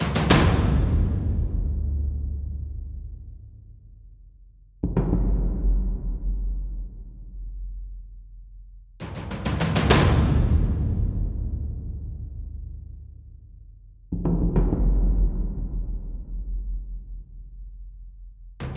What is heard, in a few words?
Drums and SFX